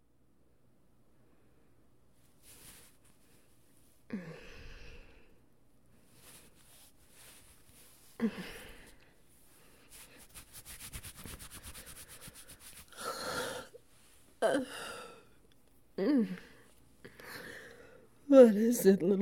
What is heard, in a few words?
Thunderstorm Comfort Heartbeat; Rem Offers